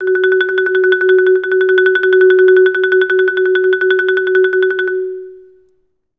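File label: TREMOLO F#5, Orchestra; Best Service; Peter Siedlaczek's Advanced Orchestra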